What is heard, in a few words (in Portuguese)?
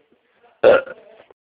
arroto